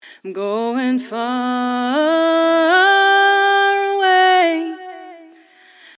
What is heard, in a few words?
long delay; vocal delay